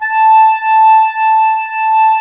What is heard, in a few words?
DIATO2 A3, Historical Instruments; USB Soundscan vol.23